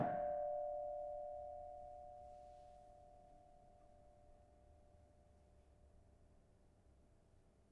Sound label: Kenong soft, gamelan; gravityharps; timbrebox